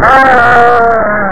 death; impossible mission

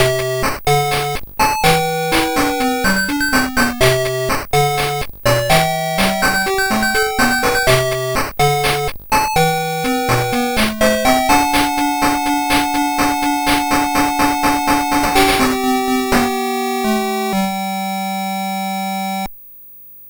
double psg

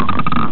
ball in hole

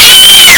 scream; alien syndrome; mary

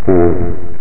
laugh

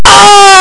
aargh; world games

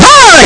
ball; dunk kids

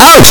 baseball out; great baseball

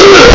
death; bonanza bros

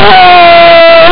player death; altered beast

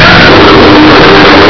roar; miracle warriors

